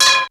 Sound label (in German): GLOCKE; FX PERCUSSN